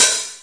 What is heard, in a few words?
909 Hi Hat Open; DRUM MACHINES; 909 Extended